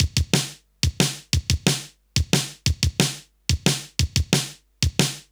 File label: Kick Snare Loop; Construction Kit; Drum & Bass